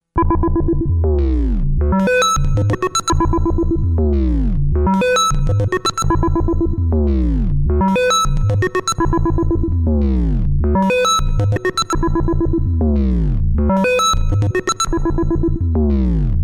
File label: Rotate verb; Quad lfo